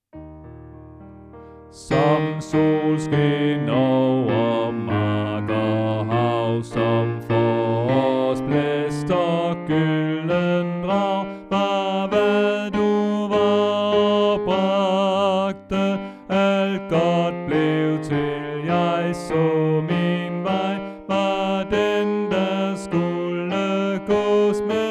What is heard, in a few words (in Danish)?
Bas; oevefiler